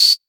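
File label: CR78 Tambourine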